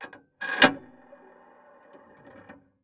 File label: PayPhone